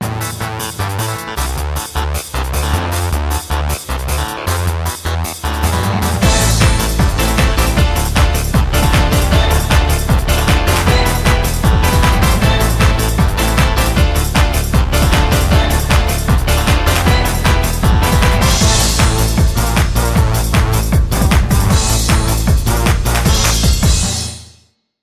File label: ringtone russian techno